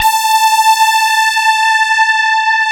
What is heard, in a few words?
CMI PIANO; Wizoo; Powered DX